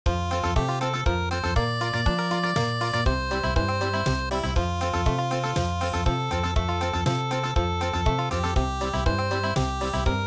Country

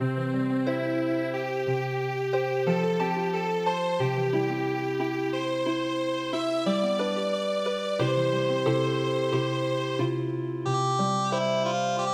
Healing Harp, midi; Natsuha Kinu

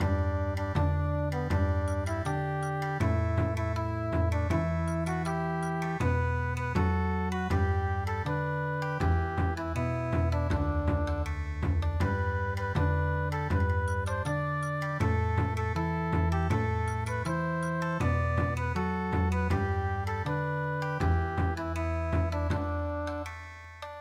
Bon Odori

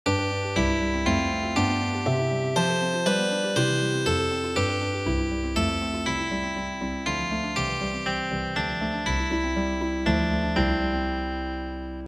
Healing Harp, traditional

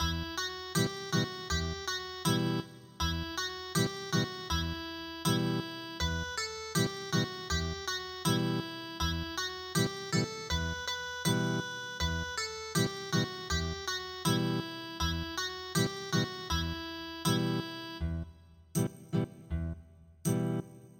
canon Ethnic Guitar; traditional

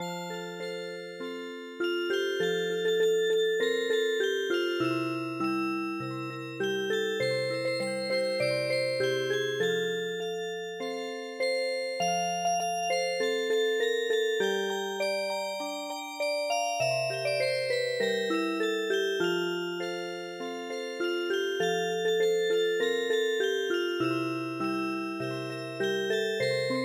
Music Box, midi

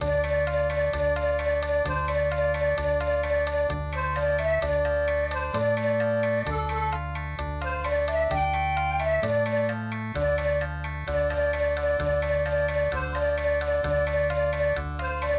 Jin Jin Okinawa

